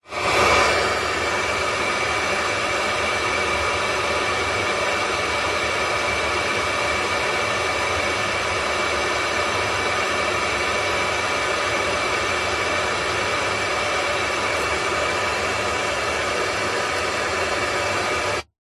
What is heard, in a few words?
car radio static turn up play then off internal nissan patrol; vehicles